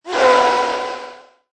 Creature Scream Whoosh, Monster creature or fantasy animal; short high-pitched howl, growl; Processed, twisted sound; Lynne Music